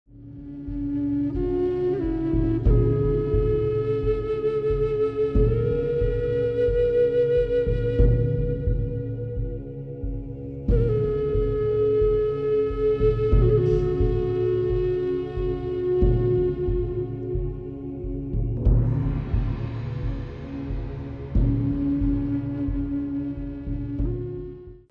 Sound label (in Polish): muzyka bez oplat